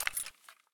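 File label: weapon round in chamber hand; Escape from Tarkov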